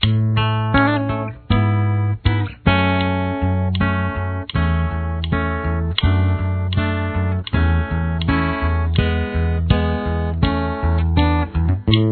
verse guitar

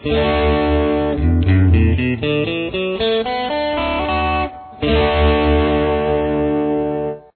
D style open, guitar

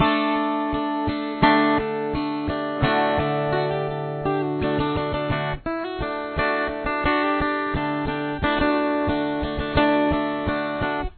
fast intro, guitar

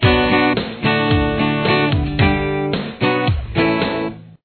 chorus, guitar

